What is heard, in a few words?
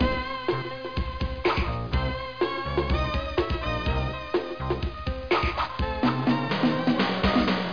Jam closer